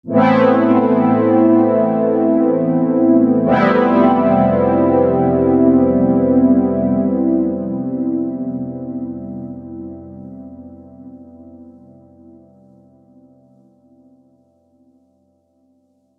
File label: Pad